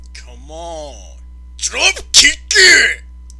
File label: dropkick; miconthemic